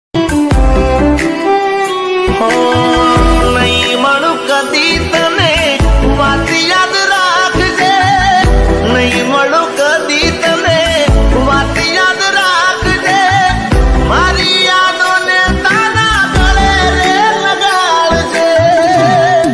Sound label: Gujrati Ringtone